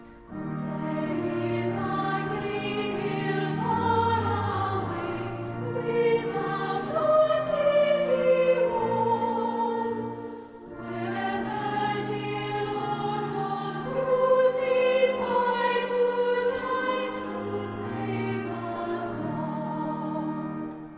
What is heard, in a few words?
choir; easter music; greenhill